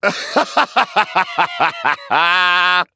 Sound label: laugh